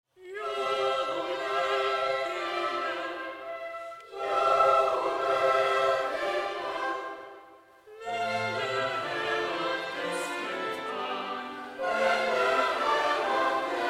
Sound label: jubelpsalm in f dur juble himmel